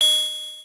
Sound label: confirm; Super Smash Land